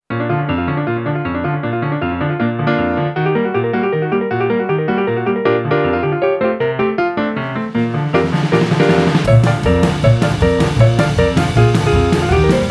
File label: Ragtime; Greed Corp